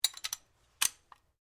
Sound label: Rifle Remmington 700 7.62mm Magazine Engage; Guns; A selection of domesttic firearms; Rifles